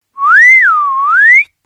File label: Whistle; Sony Ericsson; PlusFourSix; Cyndi (W850)